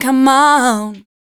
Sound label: FEMALE, Dance Vocals